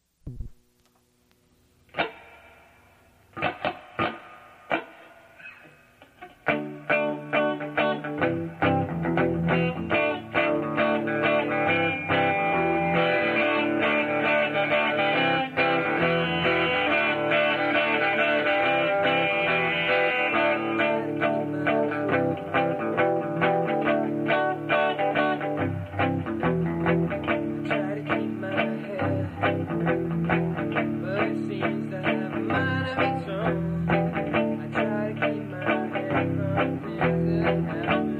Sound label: Guitar Riff